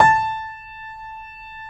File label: JAZZ A0, Keyboards Acoustic; USB Soundscan vol.17